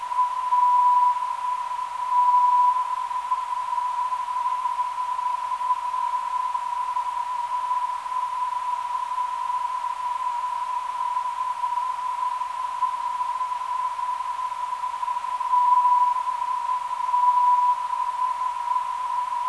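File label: non-directional beacon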